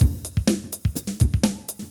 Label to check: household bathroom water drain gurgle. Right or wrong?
Wrong